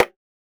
Perc; Cymatics; Drum One Shots; Percussion

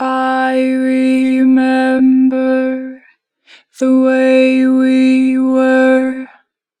Vocals, I remember; 4 bar flat; Harmor Vocals; Pitch Flat

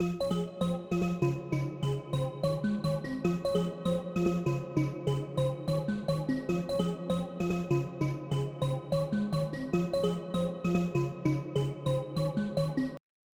Bell, 148 BPM F Maj; Cymatics Gems Vol 5; Astro

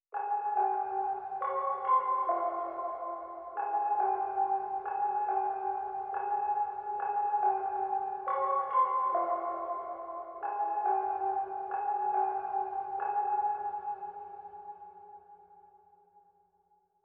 140 BPM F Min Music Box, melody loop stem; Trap; Loop Stems; Trap Pt 2; Plug Talk

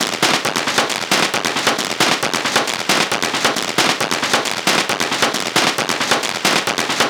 Perc Loop 135, Techno

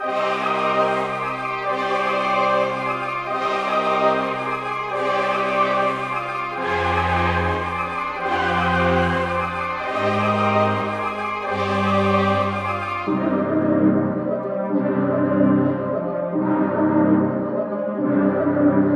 147 BPM C Min, Melodies

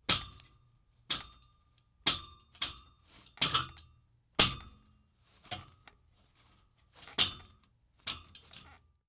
Hand On Fence; Fairlight Sound Lib

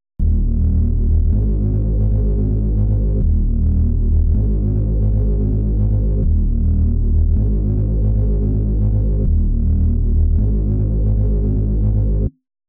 159 BPM D# Min Bass; Melody; Loop Stems; Cymatics; 2022 Melody Collection Platinum Expansion; Sky Blue

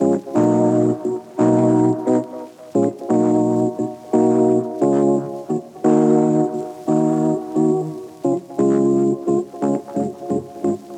Classic Melody Loop - 175 BPM D# Min Organ; Melodics; Loop Stems; Cymatics; Eternity Classic Melody Loop 9

